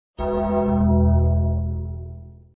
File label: gong; undertaker